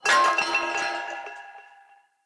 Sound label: Corrupted Battle Dog Bell Swing Long; Foley